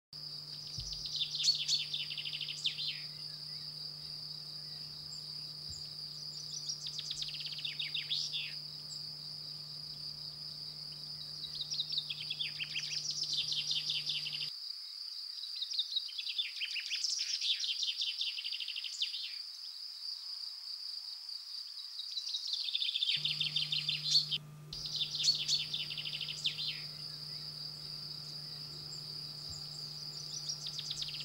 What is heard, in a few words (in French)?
Pinson des arbres Common Chaffinch Buchfink Fringilla coelebs